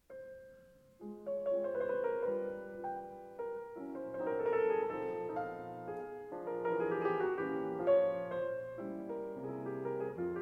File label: Mazurka f-moll; Chopin; op. 68 posth